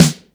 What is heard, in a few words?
Snares & Claps; Vengeance Samples